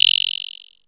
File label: guiro, synth guiros; Zero-G Ambient 1